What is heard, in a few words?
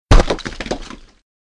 brick; modern warfare 2